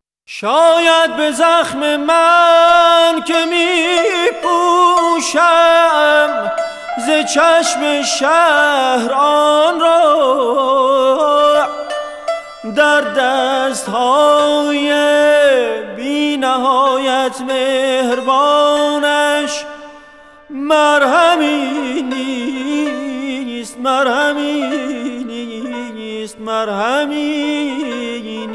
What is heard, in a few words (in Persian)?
Saz o Avaz